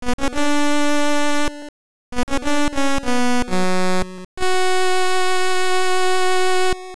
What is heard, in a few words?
Instrument Conch Horn; The Conch Horn; Sirens Instrument